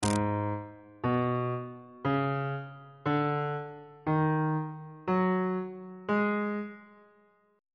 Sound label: g sharp blues scale on bass clef